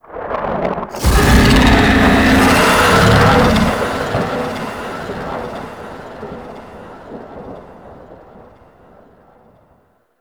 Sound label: Infested Micro Planet Moon Monster Bellow; Warframe; Microplanet Sun Monster